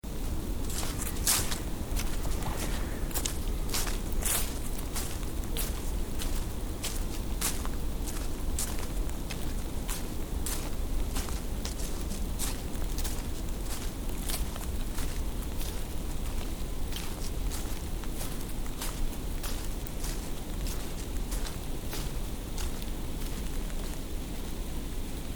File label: walking in woods